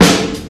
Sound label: snare one shot f sharp key